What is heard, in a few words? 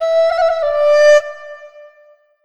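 CH FLUTE, Chinese flute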